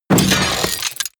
robot transformation metal short

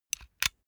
puncher operate empty

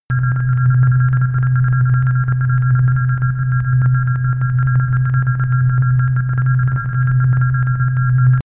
transmission everlasting signal loop

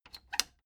plastic button latch squeak click disable slow

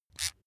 razor small short

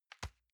envelope drop soft dry

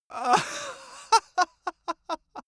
male laugh bright

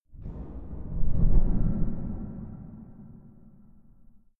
sliding impacts

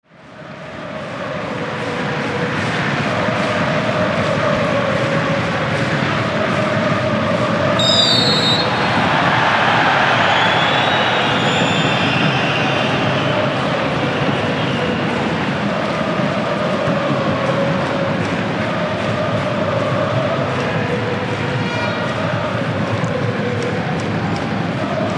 soccer crowd after a foul

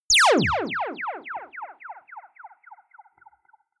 single blaster

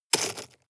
drop stones small hard

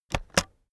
keyboard external space press slow heavy

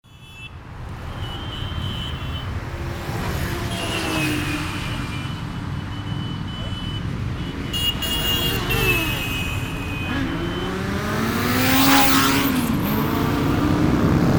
motorcycle on main road

